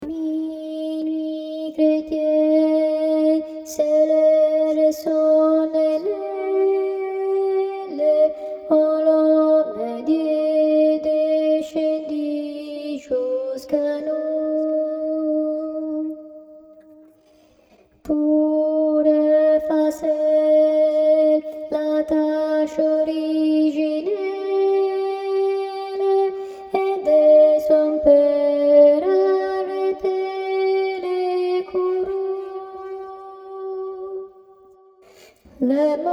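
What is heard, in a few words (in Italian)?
contralti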